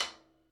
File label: Rim Tom